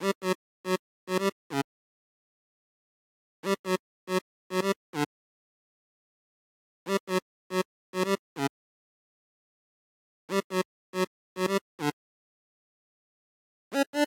Melody; chippy

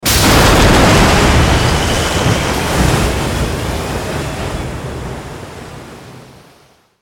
Enemy Motorboat Destroy; Godzilla Smash3

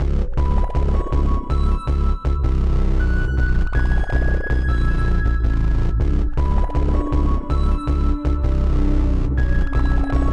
Blood Party No Drums Stereo

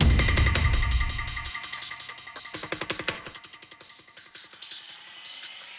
Dramatic Mood

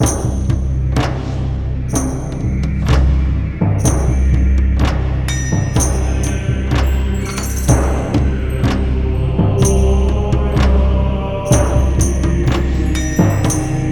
Chant loop; Stronghold Definitive Edition

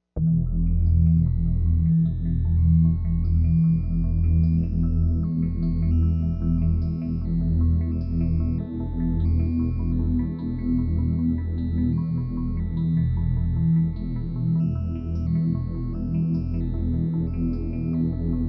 Low Pad E; Deal or No Deal; wii

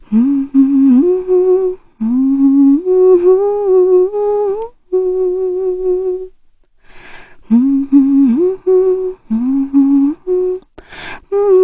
Ballade Vocal; Phantasy Star Online 2; PS Vita

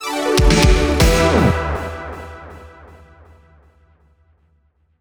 mission start

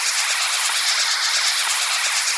Catherine Wheel Loop

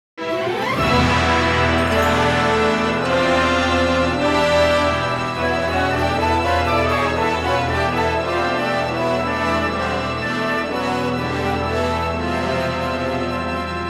Heavy Rain Castle; dragon marked for death

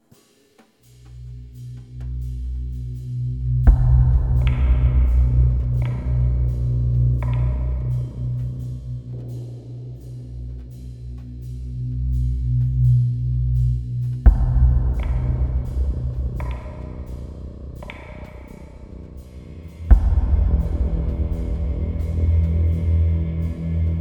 Airport Bass Drums; HitmanGoDLC; Hitman Go Definitive Edition; PS Vita

